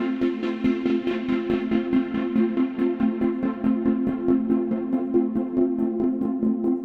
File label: techno pad 140; Techno2; Keys